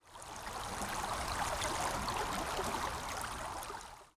ambient battle; World Of Warships

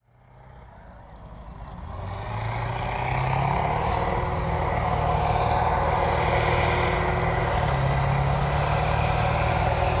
hangar tanks passby; War Thunder

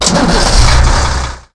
Vehicle Engines; Red Orchestra 41-45

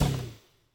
recycle stop; Rust

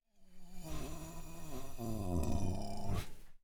sleeping; Rust

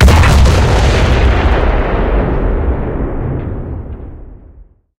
cannon 150mm type38 shot

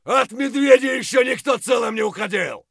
fight; Escape from Tarkov

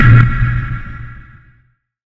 ui select; user interface

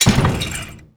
turret mgun off

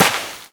foot dirtsand

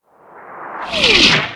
artillery incoming; Explosions

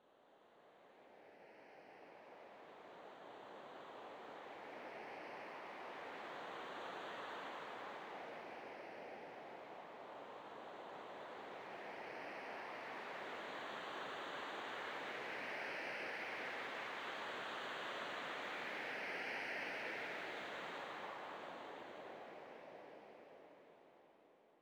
sting gentle wind; Rust

